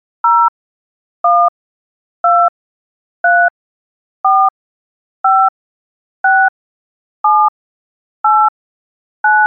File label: dtmf